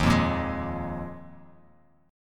D sharp Suspended 2nd Suspended 4th D sharp Bass Standard; strummed; JGuitar